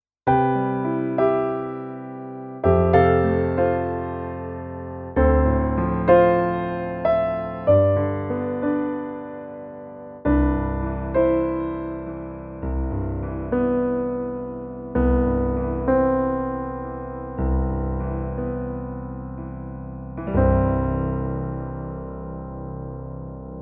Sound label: Roland FP E50 Concert Piano